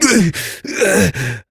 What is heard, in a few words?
Death, Adult; New War; Operator